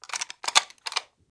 m249 box out

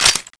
p90 clip release; weapons

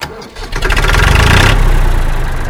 crusher startup

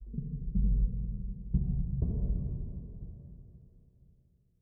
metal hit far; soundscapes; occultists

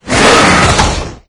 attack, maggot; doom3

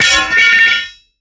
drop weapon

